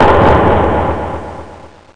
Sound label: knock; The Amazing Spectacular Ordinary Bottle